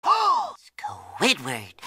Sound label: gasp; squidward